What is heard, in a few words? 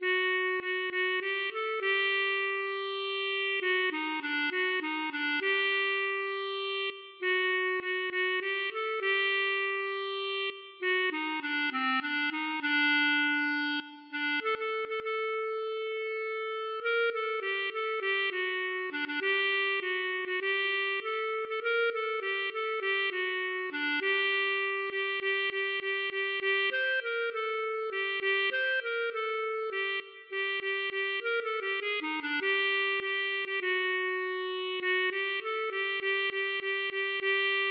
klezmer